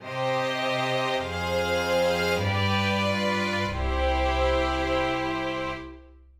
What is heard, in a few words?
cadence picardy